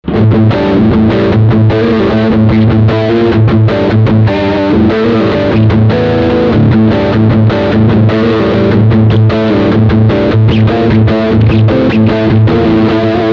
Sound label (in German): Riff Humbucker Beide